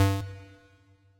Player bump; Pokemon Solar Light & Lunar Dark 1.0.1

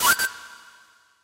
Battle jump to ball; Pokemon Solar Light & Lunar Dark 1.0.1

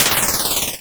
Cry; Pokemon Solar Light & Lunar Dark 1.0.1; Cries

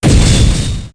drop heavy; Pokemon Solar Light & Lunar Dark 1.0.1

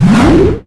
grass; Pokemon Solar Light & Lunar Dark 1.0.1

